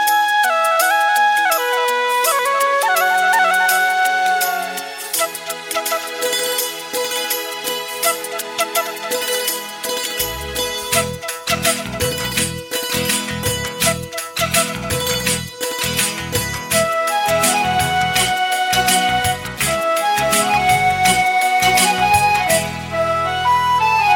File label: sweet flute piano